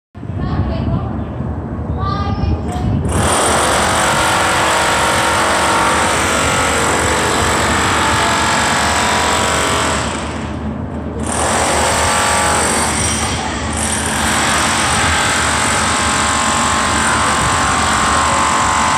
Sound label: Drilling